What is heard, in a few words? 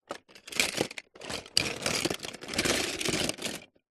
leisure toy cars in plastic tub short movements tip side; hotwheels